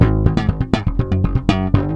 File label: Funk and Thump, Rock Bass; ACID MUSIC